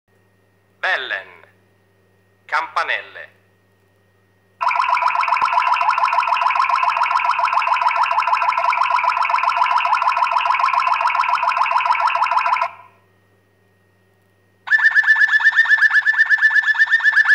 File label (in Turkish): Bellen, Malinua Kanaryası, Bellen, keskin ve net zil benzeri sesler çıkarır. Genellikle kısa ama dikkat çekici ötüşlerdir; Bu nağme, kuşun ses kontrolünü gösterir